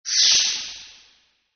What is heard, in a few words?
swish in